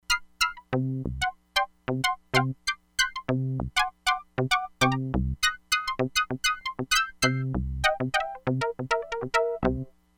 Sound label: UPPER OCTIVE PERCUSSION, Crumar Bit 99; Factory Programs